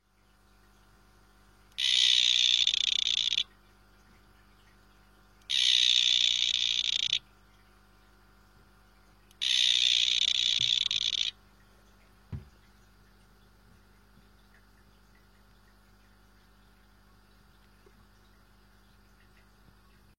Excidobates mysteriosus calling sound

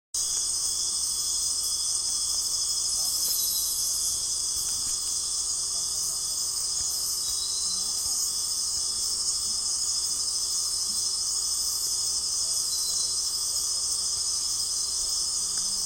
smooth fronted caiman

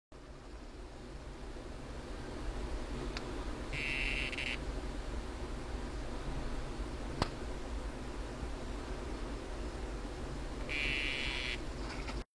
alanis calling

